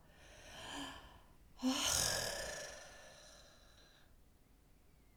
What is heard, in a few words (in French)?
soupir; Index des soupirs; Hadjithomas Joreige